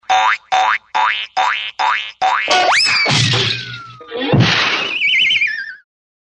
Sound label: Bonk Bonk